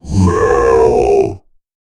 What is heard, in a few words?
demon chanting to battle